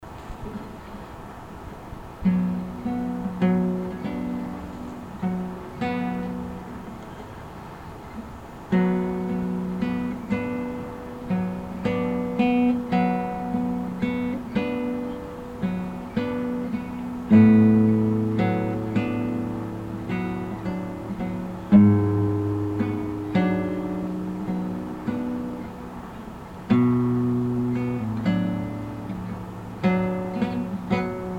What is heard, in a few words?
Guitar